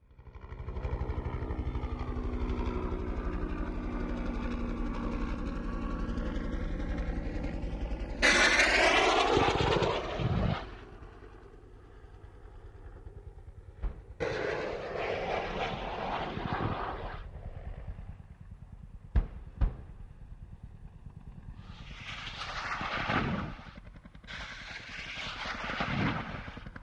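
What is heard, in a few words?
Ah1 Cobra Heli By Distant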